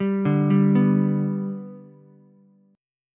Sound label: G Major G down Ukulele; G arpeggiated; JGuitar